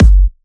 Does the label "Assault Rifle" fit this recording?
No